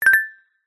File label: multimedia notification bright metal tuned mallet nudge reminder; zapsplat